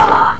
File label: cry